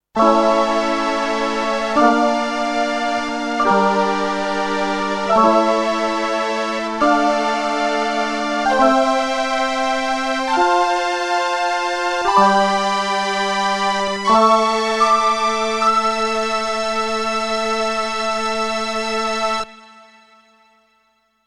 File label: strings; eminent 310 equinoxe